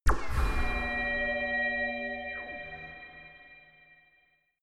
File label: ringing laser beam shot; soundpool